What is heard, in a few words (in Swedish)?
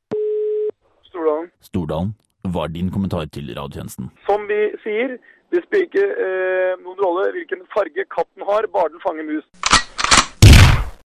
Jingle dramatisk; Stordalens katt